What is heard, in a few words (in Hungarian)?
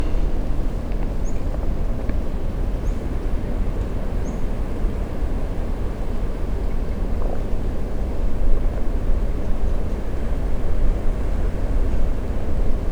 gyomorkorgas zugo szel